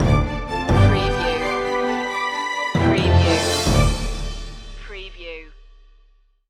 fantasy forest stinger

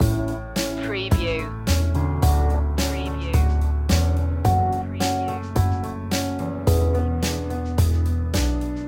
romance loop

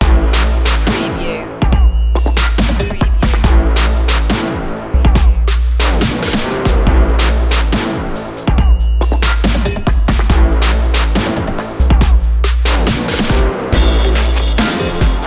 no vocal fx

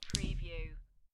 Dice Throw Two Dice On Felt

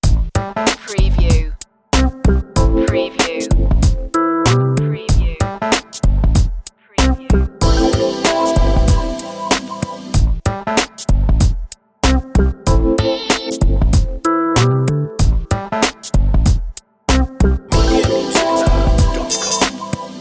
funky summer loop

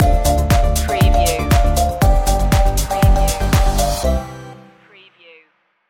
my jamaican love stinger

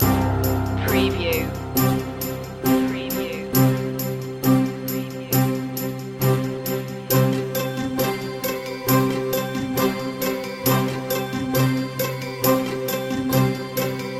big celebration loop

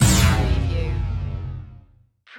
Laser Flange

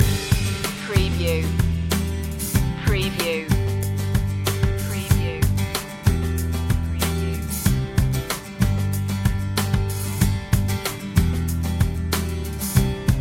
crash loop

